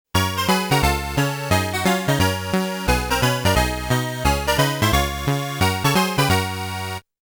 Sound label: Game Over Music; Mappy; Namco Classic Collection Vol.1; arcade